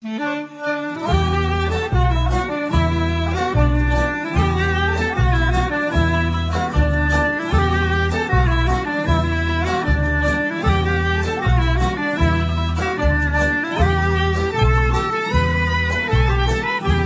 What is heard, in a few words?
Sperveri Choir; trad; ChoirJeanneDArc